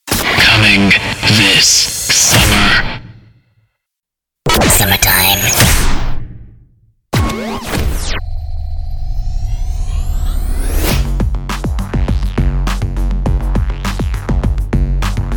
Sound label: summer jingles